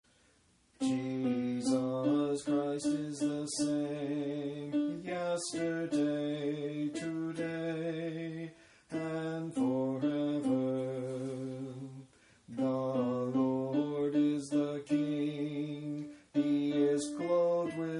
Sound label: communion antiphon